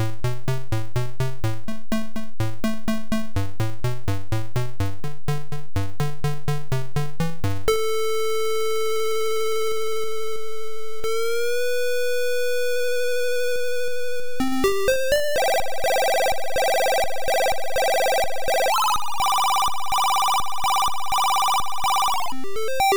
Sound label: Voices